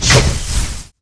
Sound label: Lightning